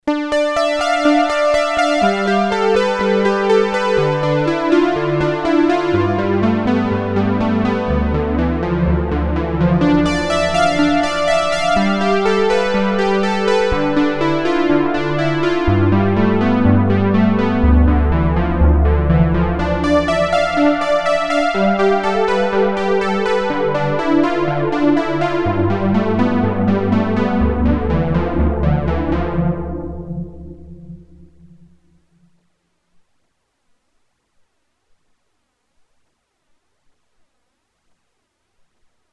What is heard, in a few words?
Roland JU06 Roland Juno106 Roland Juno60